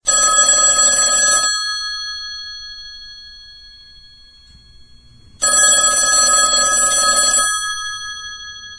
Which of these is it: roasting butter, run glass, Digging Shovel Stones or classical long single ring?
classical long single ring